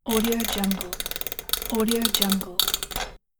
winding old watches

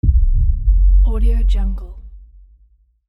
low thriller heartbeat; elevaudio

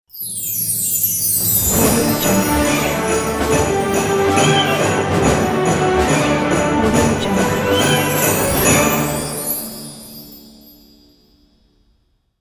epic orchestra christmas ident

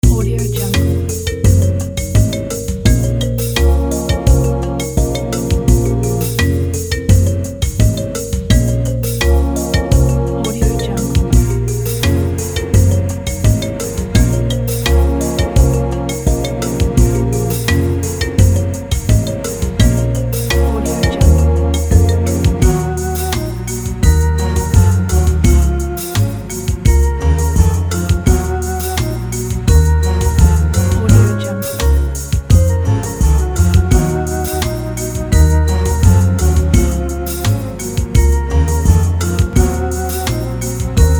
casual horror zone

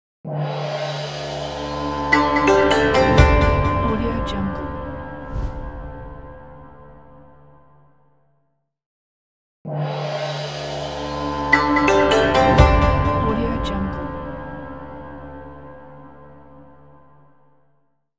oriental intro logo

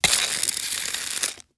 dry ice sizzles

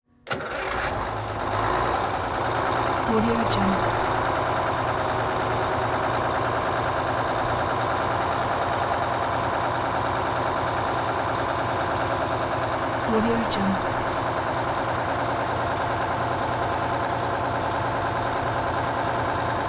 semi truck detroit diesel engine start

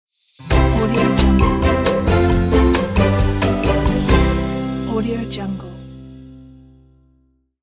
the happy logo; mountainflow

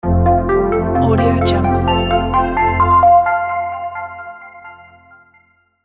elegant logo

